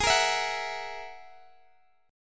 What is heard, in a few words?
G sharp 7th Suspended 2nd Sharp 5th, Guitar, Open D; x,x,18,16,19,16; down; chord shape